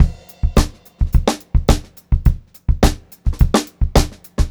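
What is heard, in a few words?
106 groovy variation 2, funk groove drumloops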